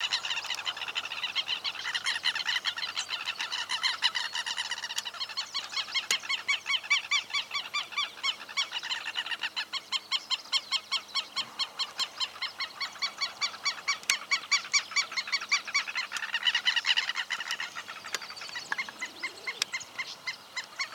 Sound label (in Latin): Vanellus chilensis, aves; Saladillo